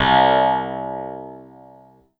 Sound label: Korg M3R Phased Clav C2